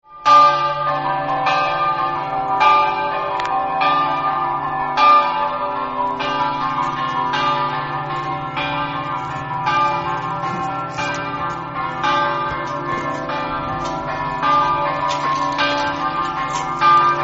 aiello, campane

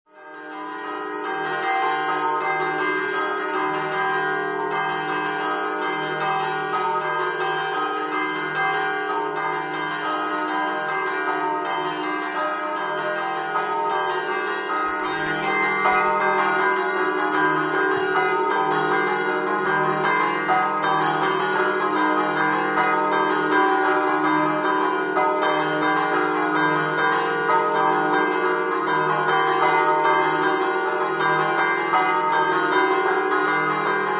cerneglons, campane

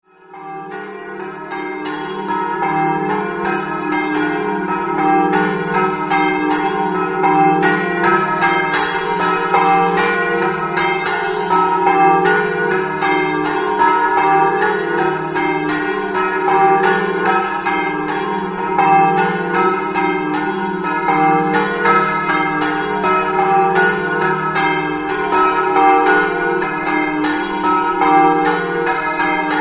cornino, campane

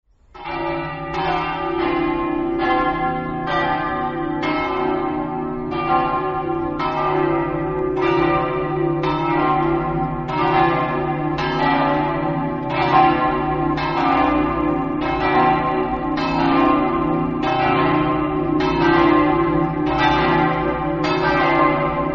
rivignano, campane

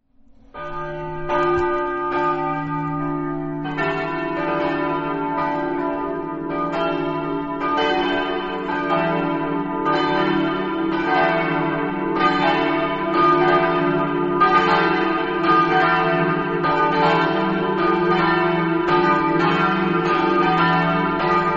forni, campane

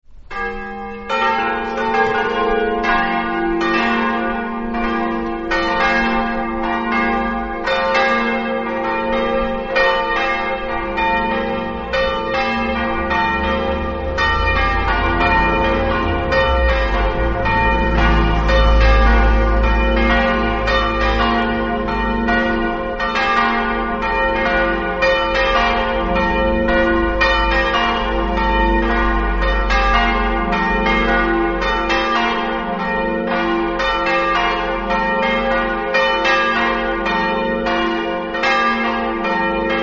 medeuzza, campane

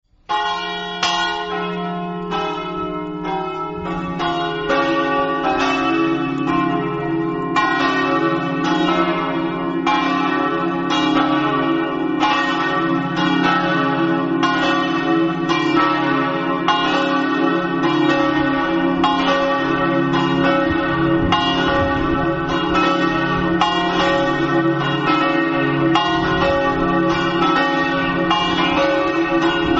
susans, campane

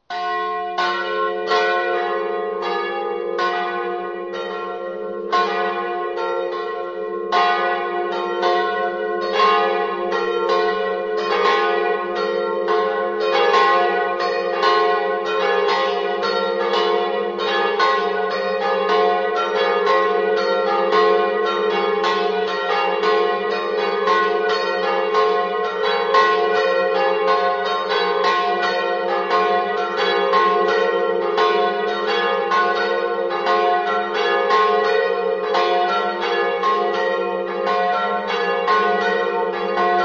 campane, sandrat

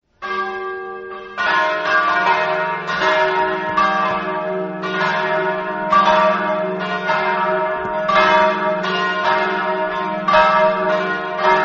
strassoldo, campane

